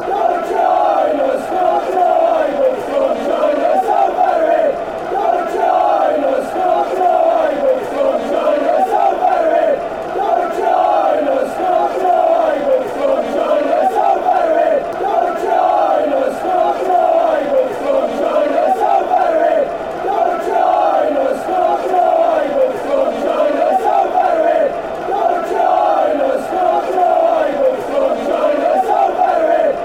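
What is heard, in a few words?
come join us, football chants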